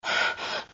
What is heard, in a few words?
Pant